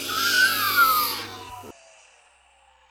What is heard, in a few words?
Raptor shreak